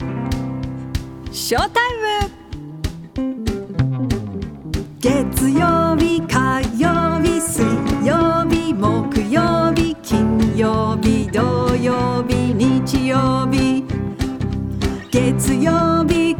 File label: song japanese